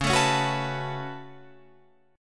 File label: Db 7th Suspended 4th Sharp 5th Db Guitar Open F; strummed